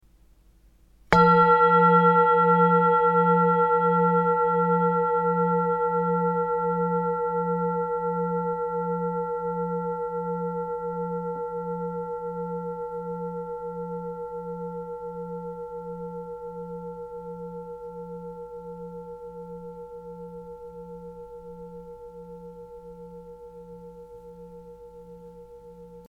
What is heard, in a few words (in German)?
Klangschale